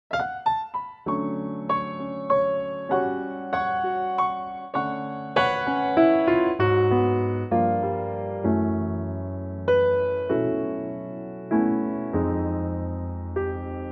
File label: D piano; key - D - vocal range - F to C (huge vocal range)